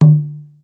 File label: kendang